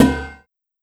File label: Perc Pot, One Shots, Drums, Misc Percussion